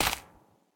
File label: footsteps moss; Fate The Cursed King